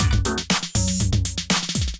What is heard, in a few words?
groove 120 bpm; Sensor Kit